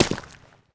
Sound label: dirt, footsteps